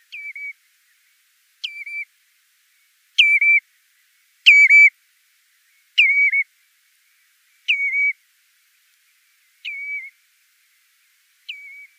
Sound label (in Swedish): Rodbena